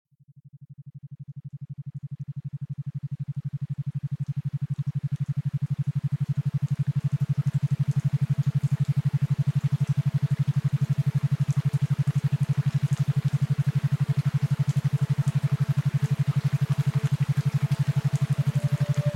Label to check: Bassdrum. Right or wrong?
Wrong